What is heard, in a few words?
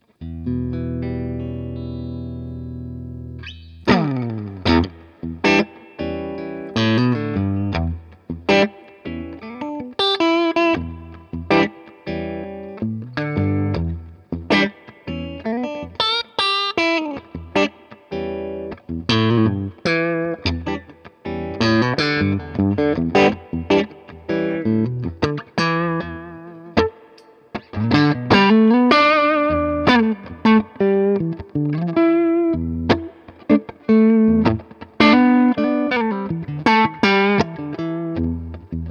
Telecaster AC15